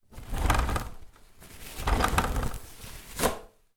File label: public places toilet roll dispense from dispenser public toilets